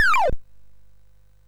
sid MOS6581R3

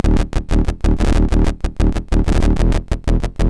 Filter Mutation, MOS6581R2

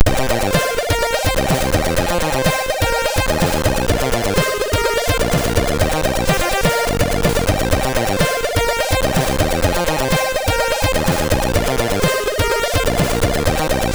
sid MOS6581R3

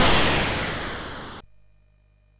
Synth Sample, MOS6581R3

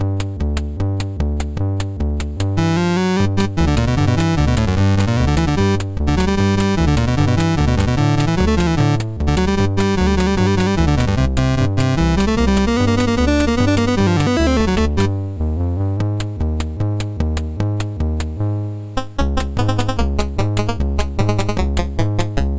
Sax, MOS6581R2

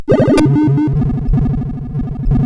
Die Alien Slime, CSG8580R5

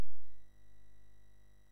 sid, MOS6581R3; Beach Buggy Simulator

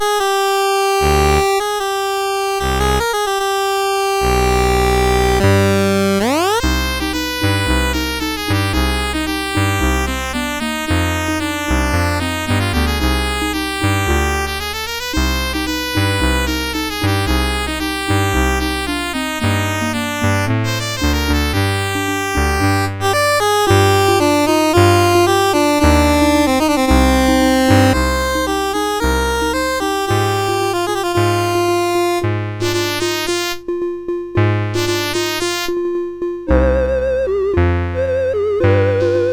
CSG8580; CSG8580R5

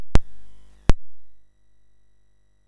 Commodore 64 Christmas BASIC MOS6581R3; Commodore 64 Christmas Album

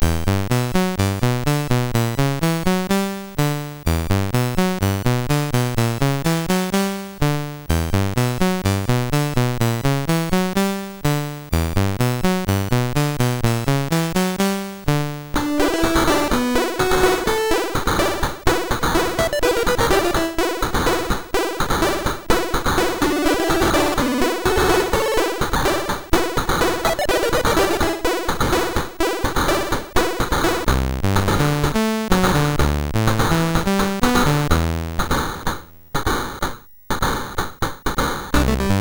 Smelly Bum Noise, MOS6581R4

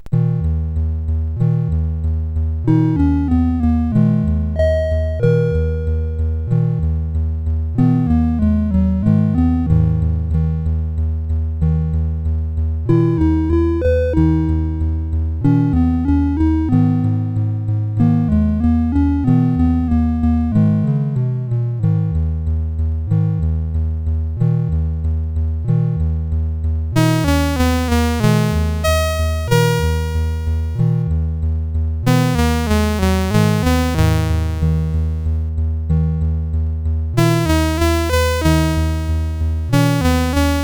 sid, CSG8580R5; Freak Factory

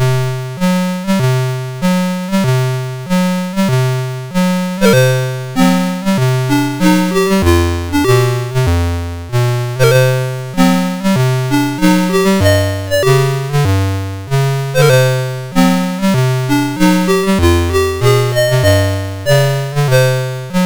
sid MOS6581R4

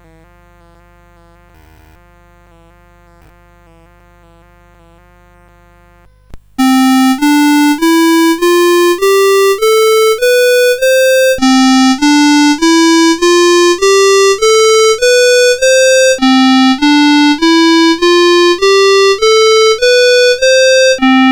filter mode demonstration, CSG8580; CSG8580R5

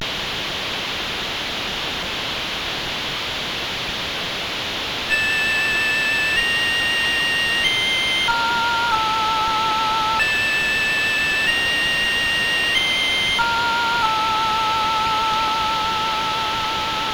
Intro, CSG8580R5

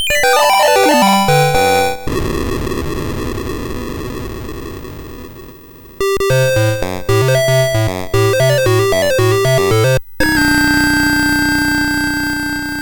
Musicbox, MOS6581R3; hvsc

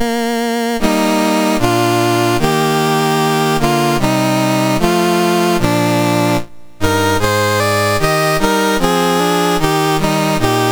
Iceland, National Anthems, MOS 6581

